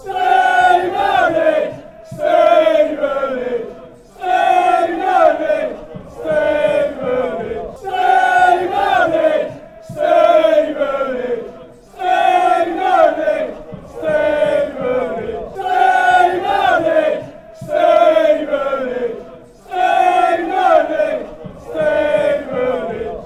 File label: slow; stevenage